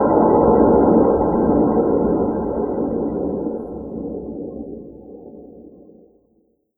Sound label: sci fi fly by